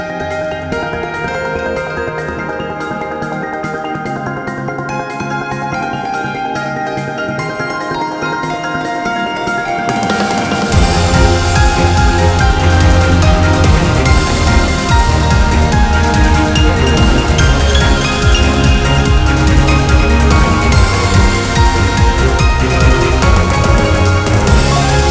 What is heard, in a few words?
BGM Mission